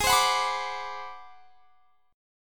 G sharp 7th Sharp 9th Flat 5th G sharp down, chord; G#7#9b5; strummed